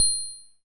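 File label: PER PROCU, Procussion, percussion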